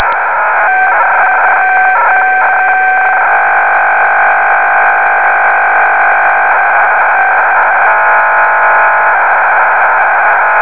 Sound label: dish